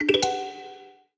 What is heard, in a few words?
Flash, notifications; Honor Pad X7